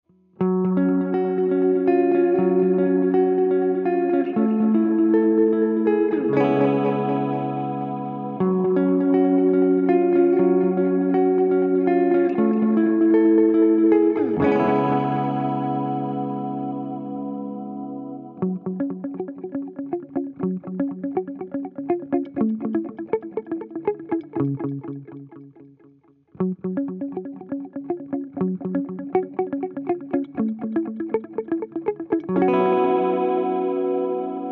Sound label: X TIME MOD TAPE; xgear